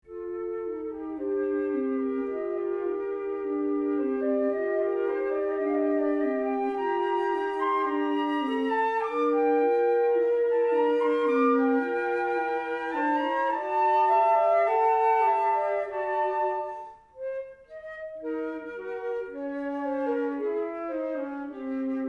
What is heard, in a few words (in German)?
Ruhig gesangvoll; Grabner; Trio